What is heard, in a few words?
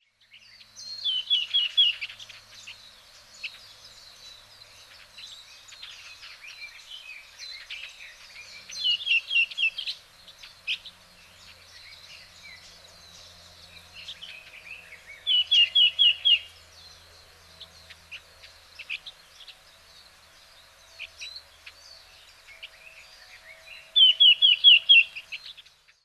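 red-billed leiothrix, hyogo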